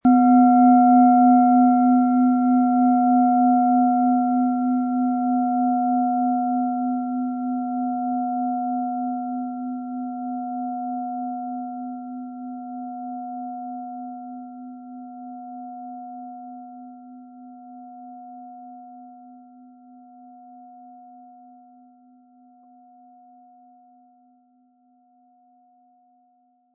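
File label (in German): Planetenschale Sonne Jupiter, Ø 16,7 cm; 600-700 Gramm; Vitalisieren und gestärkt werden & Glücksplanet; Klangschale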